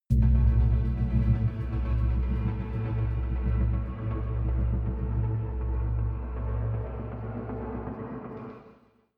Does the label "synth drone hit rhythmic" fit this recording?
Yes